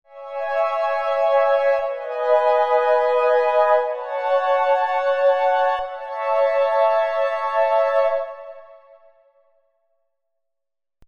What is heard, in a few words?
serum pads; chiqui audio